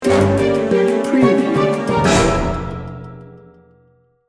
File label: curious cat sting bumper